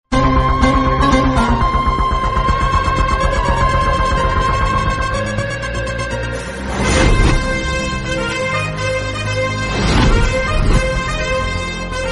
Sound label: Flute BGM Ringtone; Kgf 2